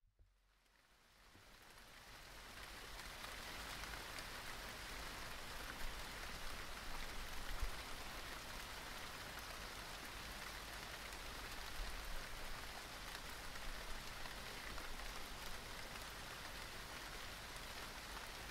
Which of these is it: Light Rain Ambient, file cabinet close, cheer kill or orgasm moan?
Light Rain Ambient